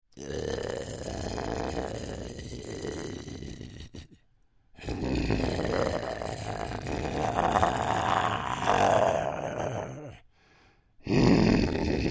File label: Creature Troll Grumbles Low, Monster; Monsters; Horror